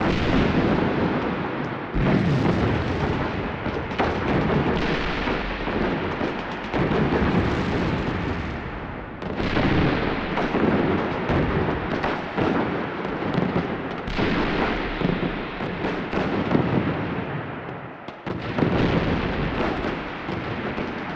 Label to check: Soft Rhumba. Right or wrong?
Wrong